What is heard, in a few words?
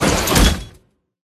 Juggernaut Liftoff Transform